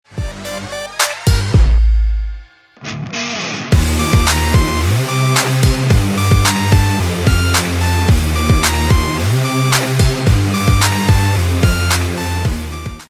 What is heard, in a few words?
phonk